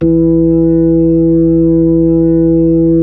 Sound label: B-3; Roland LCDP10 Keys of the 60s and 70s 2